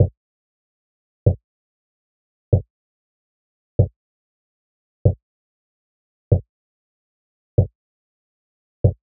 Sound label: kick